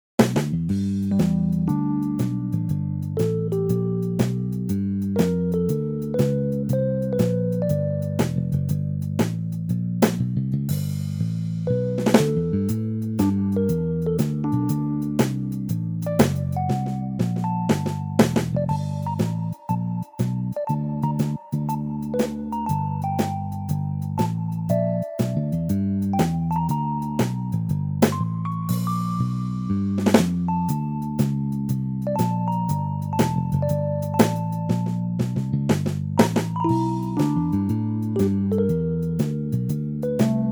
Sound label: Blues